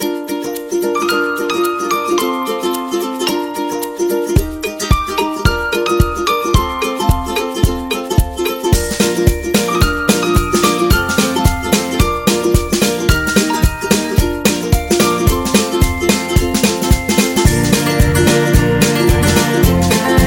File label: Child Playful, happy